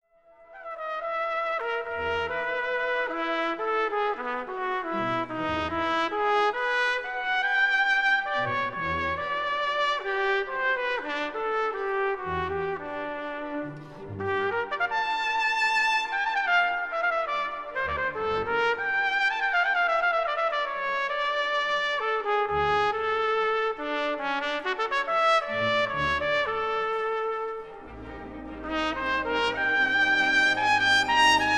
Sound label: Trumpet Concerto